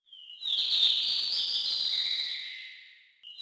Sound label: BIRD, Zoom3City; zones